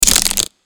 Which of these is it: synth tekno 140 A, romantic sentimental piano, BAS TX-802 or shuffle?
shuffle